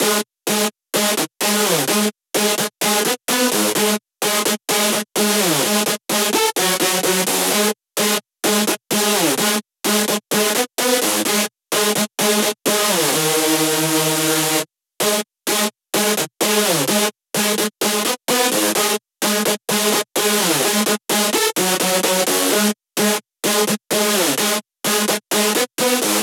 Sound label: 128BPM Synth layer DRY noSC, Root G#min; Vengeance - Essential Clubsounds Vol.5; Demo Constr Kits; Break the Chains